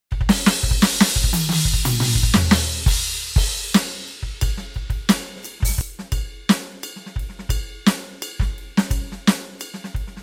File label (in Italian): Batteria